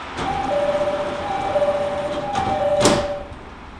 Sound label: door